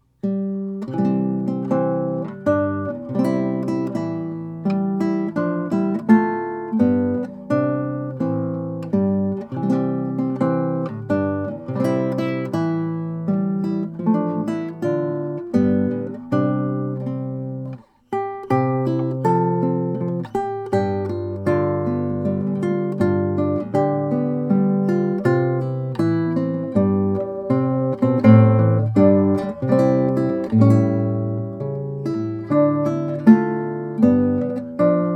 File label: O Christmas Tree Guitar